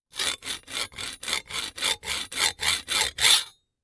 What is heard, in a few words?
Industry Metal Sawing